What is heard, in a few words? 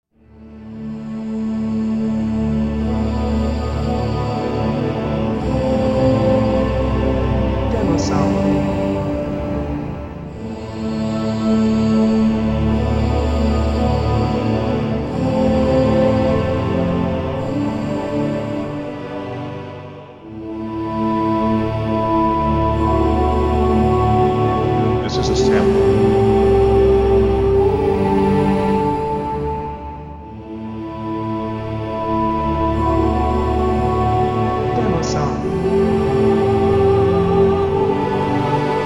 Emotional Moments Choir No Intro